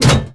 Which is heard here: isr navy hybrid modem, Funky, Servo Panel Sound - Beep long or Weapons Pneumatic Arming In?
Weapons Pneumatic Arming In